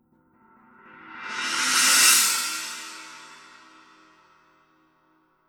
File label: 900 series 16 china roll; paiste